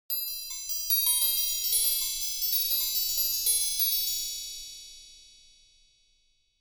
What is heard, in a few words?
magic chime